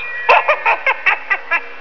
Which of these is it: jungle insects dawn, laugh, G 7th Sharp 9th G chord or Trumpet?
laugh